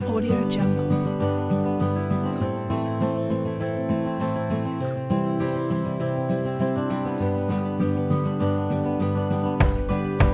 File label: countryside